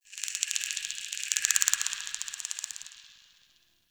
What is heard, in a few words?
clicky cave critter; Warframe; Tau